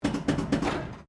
PINBALL MODERN RESET, Modern pinball table; Arcade Sound Effects; Arcades (Sound Effects:Backgrounds)

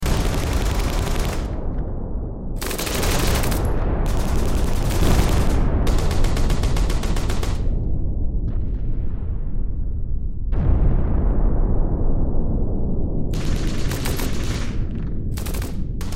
Battle WWII Gun Fire Explosions Loop; Military Battlefield; Warfare

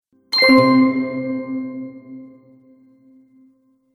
Game Positive Twinkle Accent; Video Game; Video Game Sound Effects

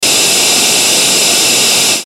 static off air; Static Off Air Buzz

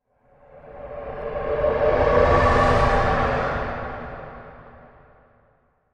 Science Fiction Spaceship Pass By Whoosh Flange; Spacecraft Sound Effects; SFX Bible 6

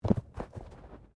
Body movement Tumble Light

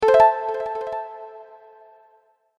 Games Win Sound, video game

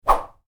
Woosh, Whoosh: Short, Light; Whooshes; Sound Design; Stuart Duffield

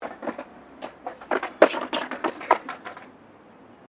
SKATEBOARD SKATE BOWL COME OFF; Skateboarding; Sports

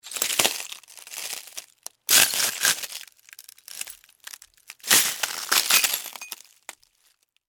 Impact Glass Step, Footsteps, Broken, Shards; Materials

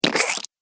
Gross Slime Squelch; Slimy Sound Effects

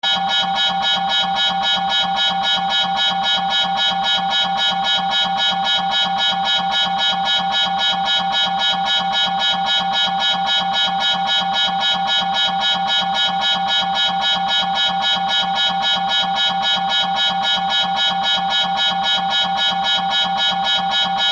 Submarine Alarm Horn Alert Interior; Military; Miscellaneous Combat; Warfare; Blastwave

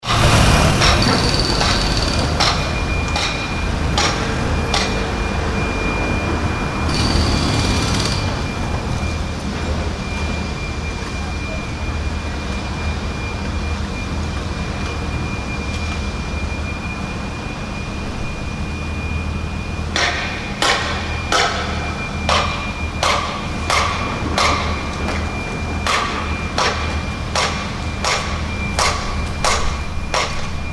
City Construction; Public fountain in city centre.; Cities; Backgrounds

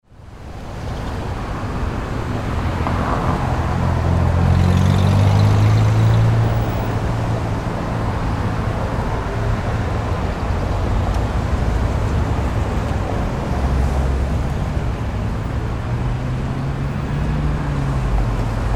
Ambience City Traffic Small; Cities; SFX Bible

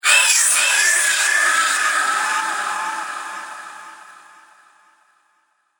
Scary Creatures, monster sound effects; Fantasy Sound Effect; Andrew Fleming; Twistedtracks

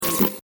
Static Blast SciFi Data Transmission; Technology; Sound Design

